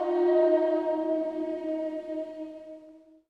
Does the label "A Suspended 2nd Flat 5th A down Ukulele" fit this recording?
No